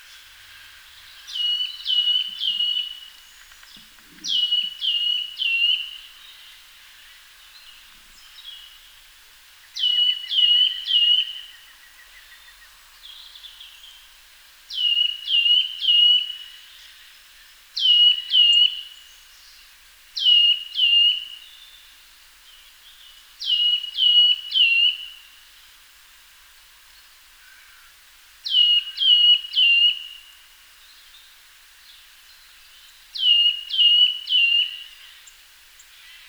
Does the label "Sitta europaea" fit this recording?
Yes